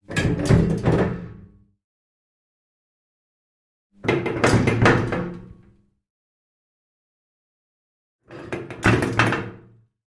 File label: Elevator Door Slam